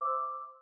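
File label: bell glitch C major; bespoke - Floral v.2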